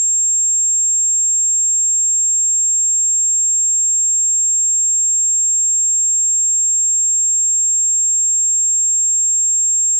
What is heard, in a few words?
7500Hz 50pc right